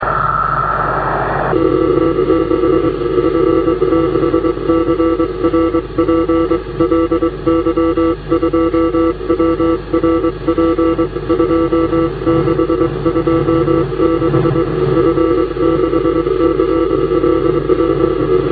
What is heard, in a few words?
qso meteors scatter, Radio